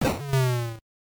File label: big en hit; Shantae